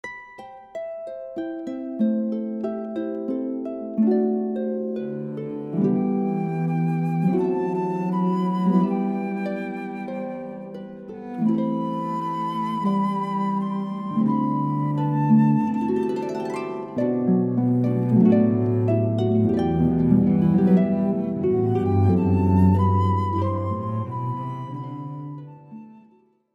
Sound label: Air, harp flute cello; Country Antrim